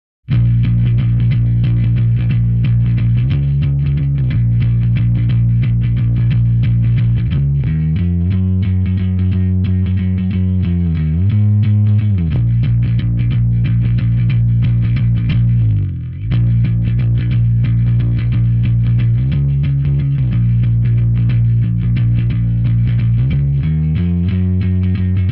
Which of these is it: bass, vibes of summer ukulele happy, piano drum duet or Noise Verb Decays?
bass